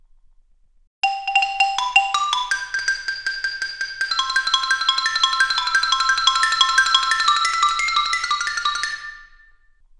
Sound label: instrument; Nutzsignal